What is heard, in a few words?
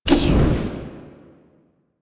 Sticky Bomb Throw; Grineer; Veilbreaker; Vor